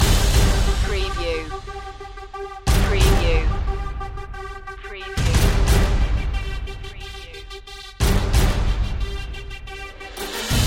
epic title underscore loop

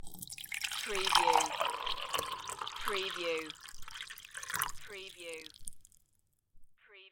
Pour coffee into a coffee mug

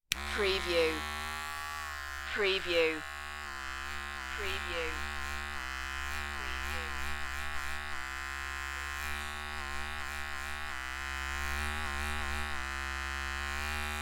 electric shaver

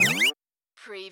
CARTOON SLIP

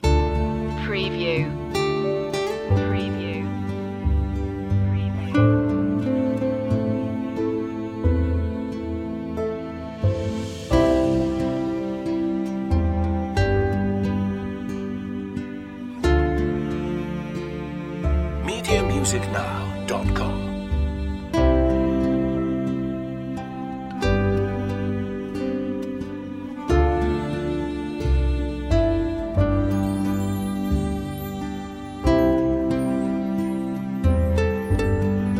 sensitive calm

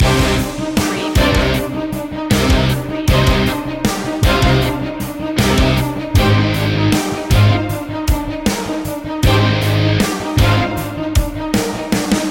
drama heavy loop

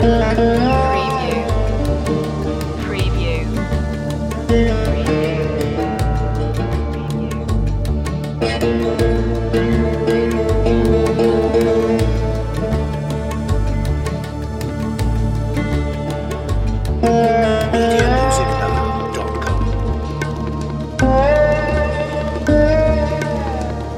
sarod and flute raag bhairav loop

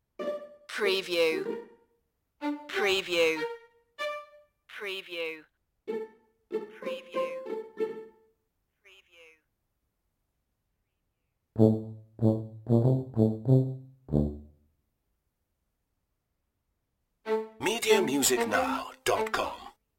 no percussion

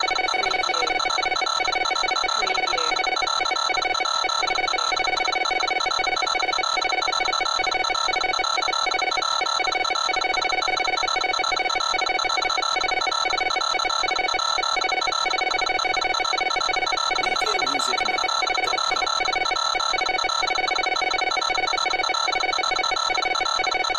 Computer Process Beep

